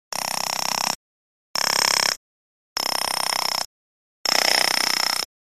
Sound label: Dolphin Commersons